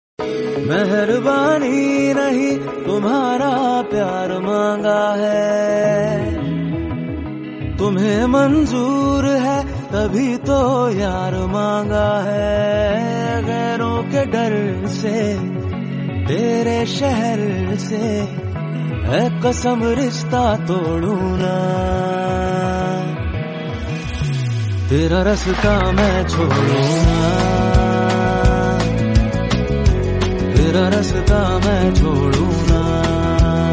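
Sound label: mashup romantic hindi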